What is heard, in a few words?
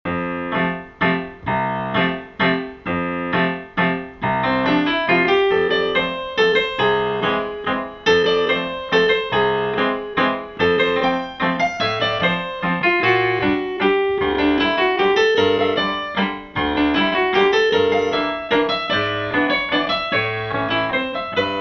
Lyric Waltz